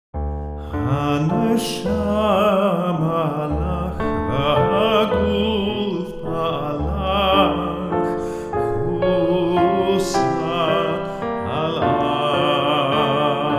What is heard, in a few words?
Hanshamah Lach solo